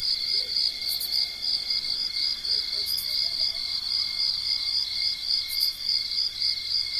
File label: ambience night crickets; everness